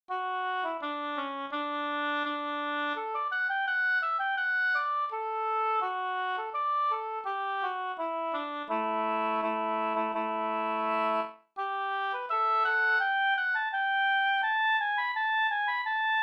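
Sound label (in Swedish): brudmarsch Flenmo brudmarsch Södermanland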